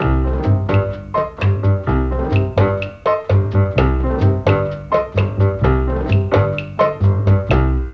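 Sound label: G#1 bass strings, Mellotron; BASSA+STRNGS; Mike Pinder - Mellotron Archive CD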